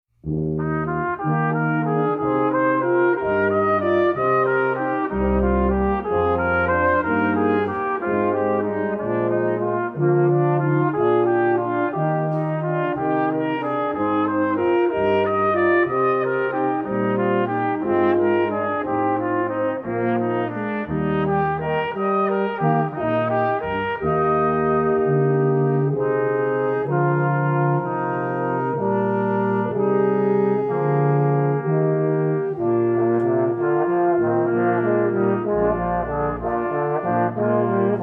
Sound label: Brass Quintet Jesu Joy Of Man's Desiring; Johann Sebastian Bach